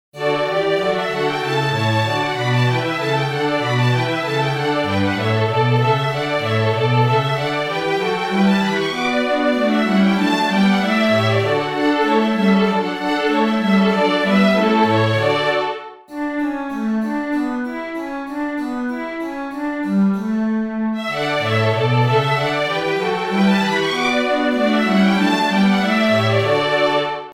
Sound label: Horn Concerto in D; Telemann